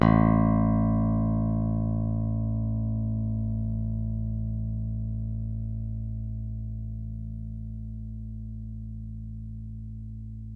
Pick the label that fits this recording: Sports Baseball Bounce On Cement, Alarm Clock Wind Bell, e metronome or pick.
pick